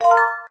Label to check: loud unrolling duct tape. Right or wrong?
Wrong